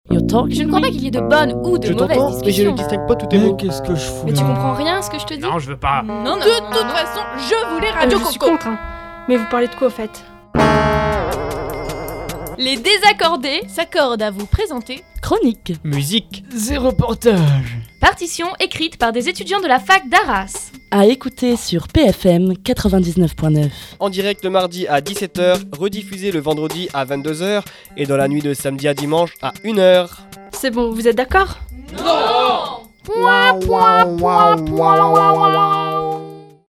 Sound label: JINGLE; LesDesaccordes